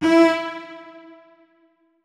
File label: notes, keyboard; Hideout Furniture